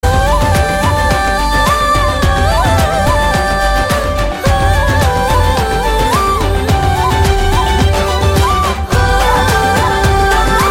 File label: Humming BGM, Telugu Ringtone; Love Story